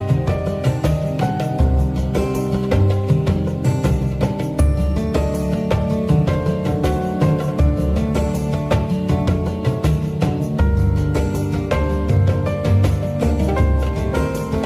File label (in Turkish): turkce elektro